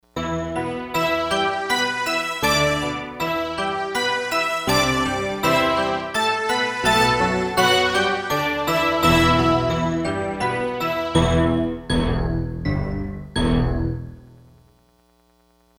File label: Octobells, synth